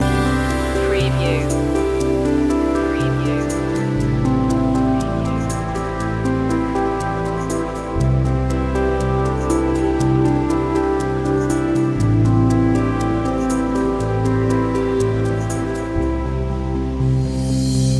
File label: inspirational achievements loop